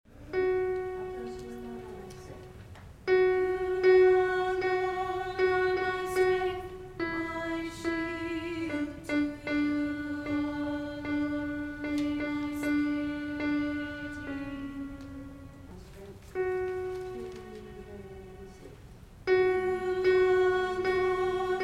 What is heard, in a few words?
alto parts